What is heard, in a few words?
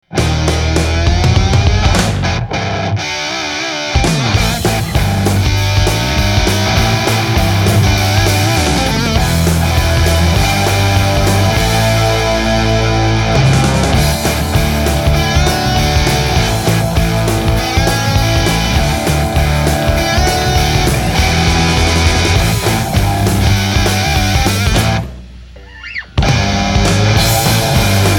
Rock